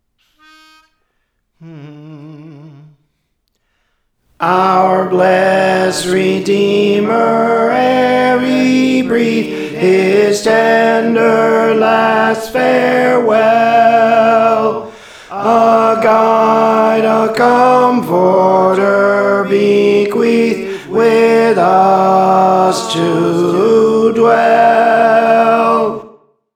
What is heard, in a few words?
Tenor; Our Blest Redeemer ere He Breathed; Praise For The Lord Training